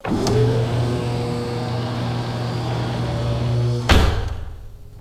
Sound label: automatic servo door closing and latching; doors